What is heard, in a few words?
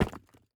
phys impact stone; Rust